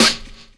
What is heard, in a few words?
SD BURN, SNARES; E-Lab; X Static Goldmine 2